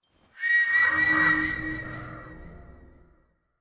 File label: shadow summon; karasu